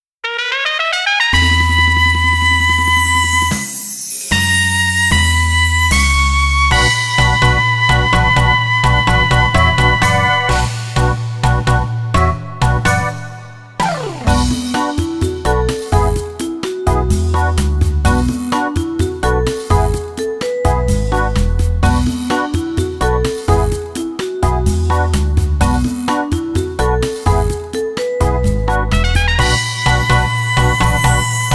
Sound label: comical